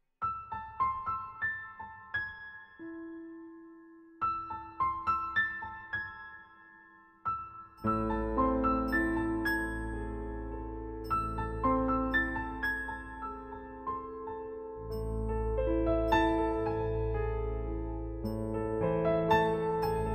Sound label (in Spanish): musica de terror sin copyright